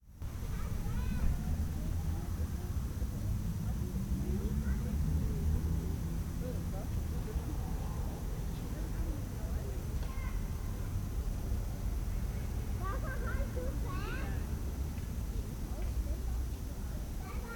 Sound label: Quiet Park